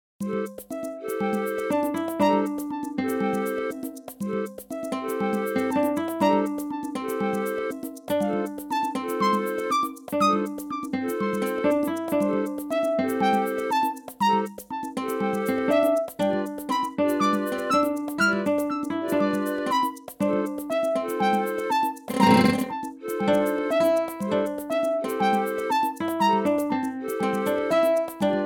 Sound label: MIDI blues; spellman studio